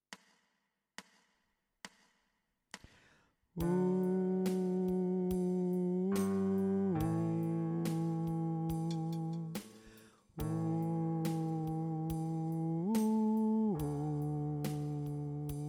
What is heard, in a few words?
hom; mourir de plaisir (tenor et basse); chants